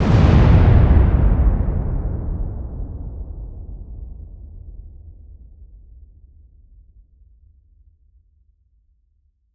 Explosion Demolition Large Deep Blast Dynamite Multiple; Fire and Explosions